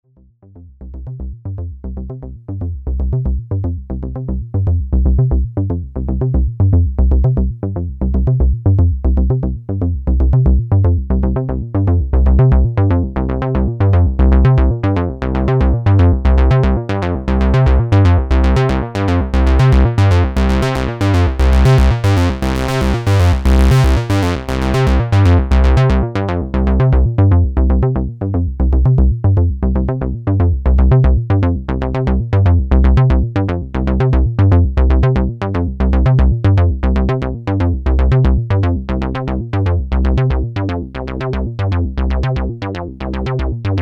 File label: Simple Sequence